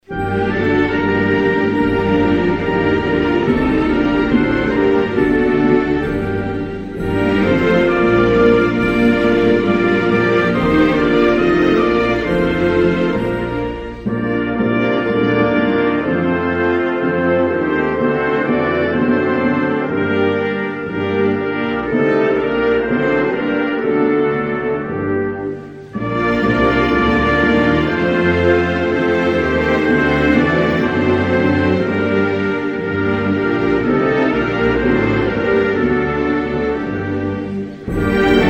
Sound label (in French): Slovaquie, Hymnes nationaux